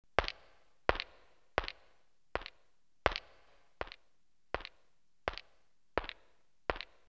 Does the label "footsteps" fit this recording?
Yes